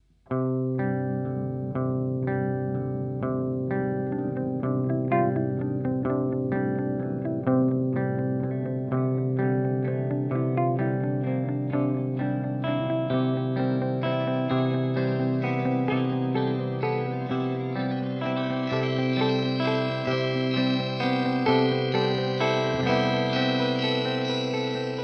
Pitch Delay; Cooper FX Arcades

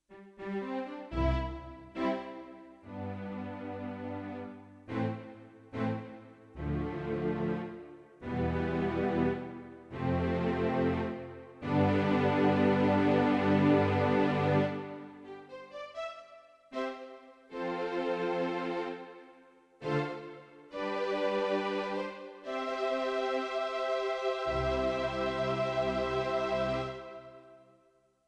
S51 Strings

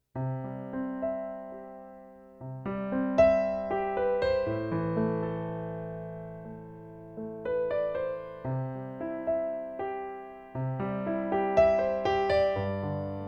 Piano

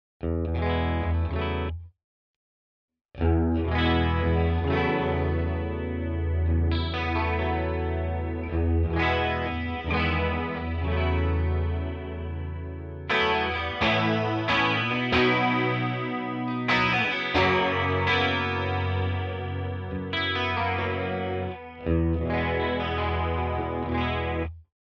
CHORUS Medium sweet; Walrus Fundamental

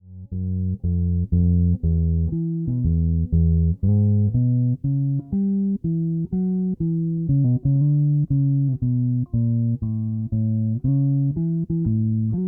Fodera neck closed

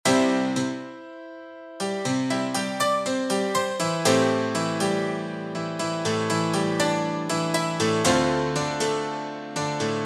Piano; Lethal Demo 3

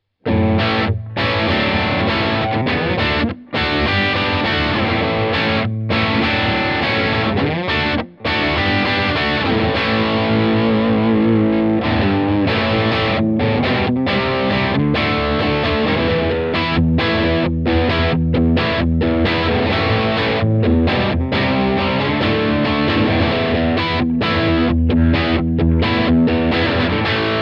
Blackstar St. James Comb Mic Crunch

